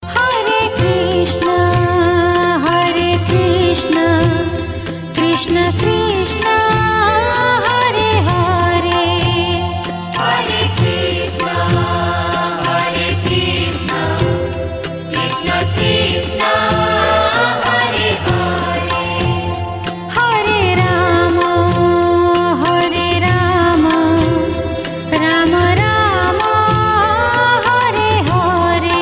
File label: hare krishna chant; krishna ringtone